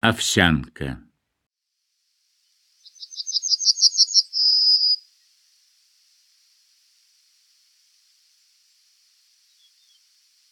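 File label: Bunting; Ovsyanka; Golosa ptic i zverei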